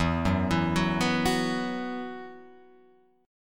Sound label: E Suspended 2nd, Guitar Open F; Esus2 arpeggiated